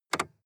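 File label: sports car interior lightswitch double short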